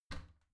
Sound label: bathroom cabinet warped open heavy; objekte; tueren